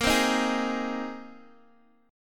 A sharp 7th Suspended 2nd Suspended 4th, Guitar DADGAD chord; down